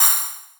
Percs

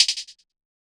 hihat flute song; Closed Hats

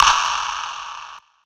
Snare

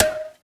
HIT, FX

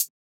Closed Hat

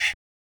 Chants

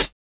Closed Hats

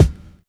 kick, Kicks

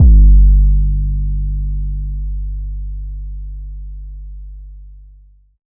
808 G; Cymatics

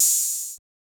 Percs

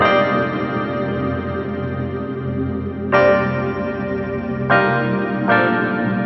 LOOP

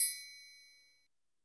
Crunk Percussion; Percs

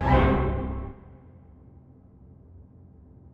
Stabz

